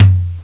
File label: low tom; drums; gmplay